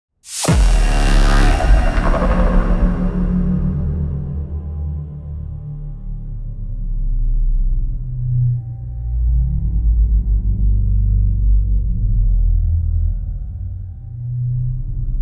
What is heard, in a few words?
Cutscenes Music; Serious Sam 4; Cathedral